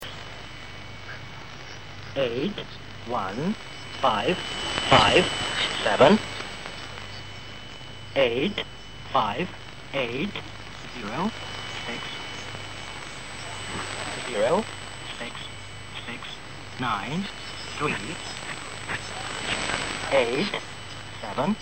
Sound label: Number Station, AM